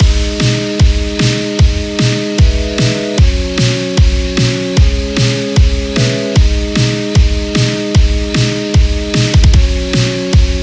Arcade parlor theme loop; WrestleQuest

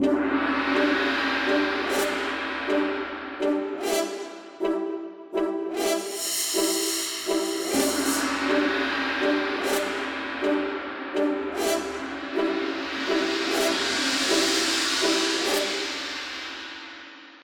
Jungle Chase Horns; Tintin Reporter - Cigars of the Pharaoh

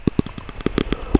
SHIVER; SHIVERS; Only the Brave Can Rescue the Kidnapped Princess

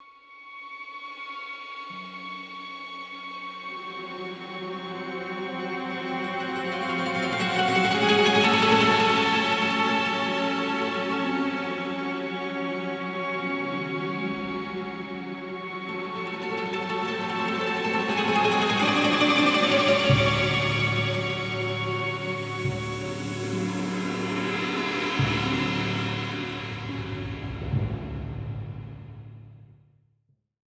Jingle Italy; rise of venice windows gamerip 2013

